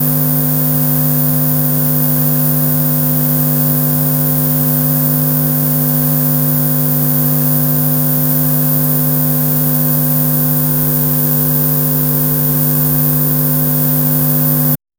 subtle led hum buzz

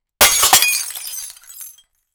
destruction

